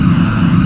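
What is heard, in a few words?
fast loop, ships engine; Quantum Redshift; darius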